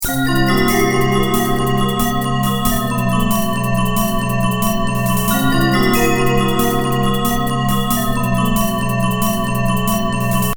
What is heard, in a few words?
magic; Pillars of Garendall; Trinity Plugin